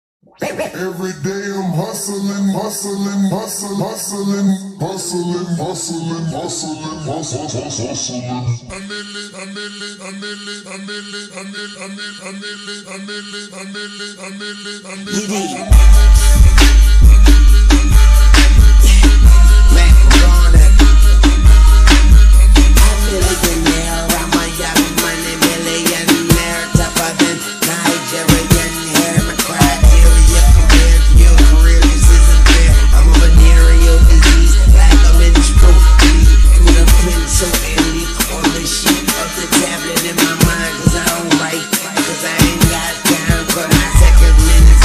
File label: slowed reverb bass boosted